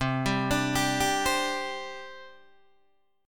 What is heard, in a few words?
C Suspended 2nd, C, down, Guitar, Open D